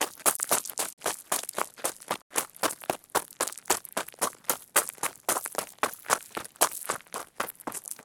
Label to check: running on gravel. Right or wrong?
Right